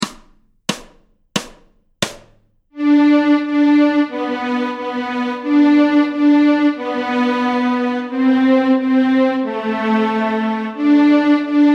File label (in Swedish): Stråk G, Fiol; Lilla snigel